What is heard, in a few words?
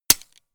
gun shot silencer attached; schusswaffen